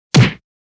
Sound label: punch body hit; fights bodyfalls; Asian Show Sound Effects